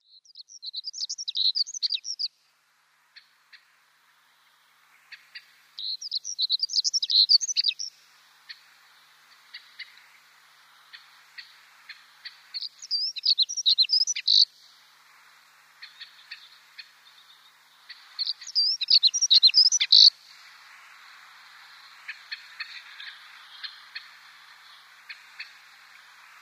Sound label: Siberian Accentor song